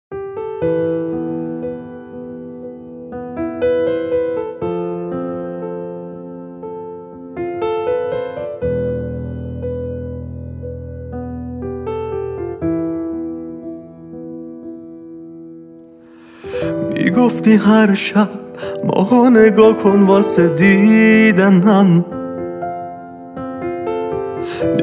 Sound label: Piano Version